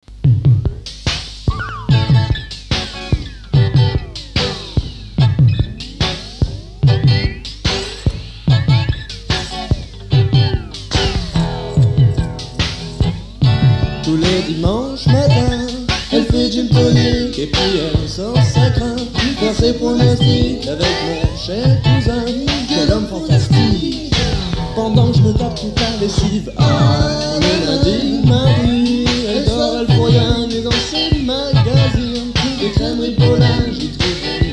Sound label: reggaette